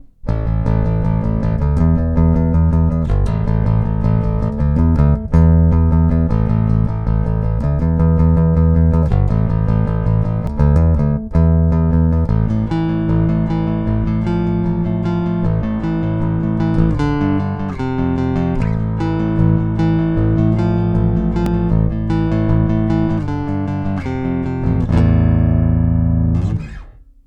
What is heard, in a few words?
Neck Pick